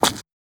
run gravel

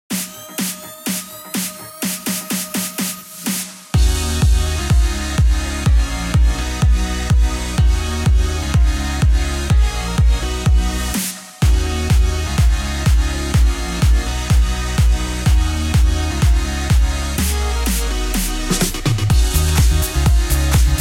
Electro; farming simulator 17 nintendo switch edition; Humming Bird

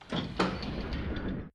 elevator activate; sanctuary1

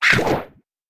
Squawk Shot; A Robot Named Fight

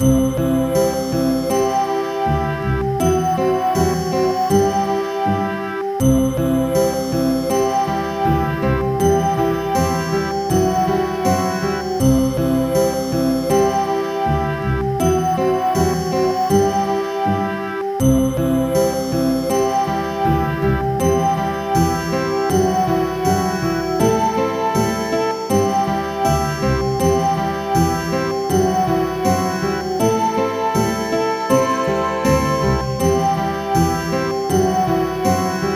Music Village

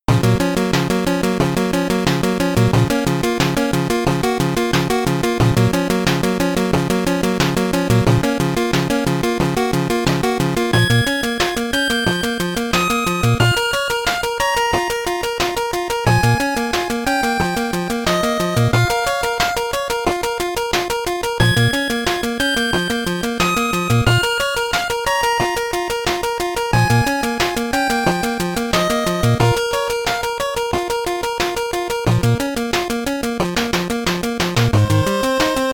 BGM INTRO 8bit; Mighty No. 9; 8-bit soundtrack

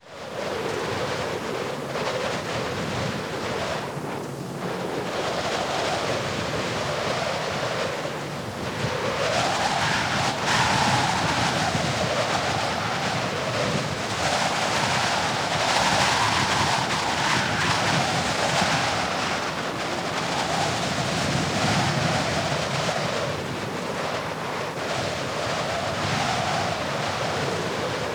Ambient BGM; Suzumiya Haruhi no Tomadoi; PS2

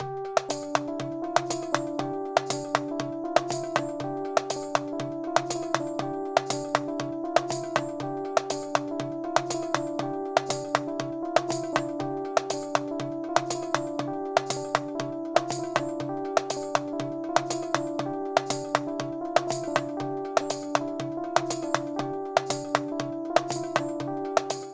Funky Chicken; lsd revamped

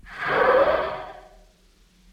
enemy death; deathworld7

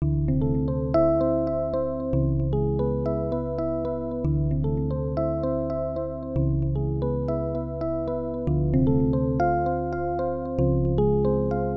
Arp

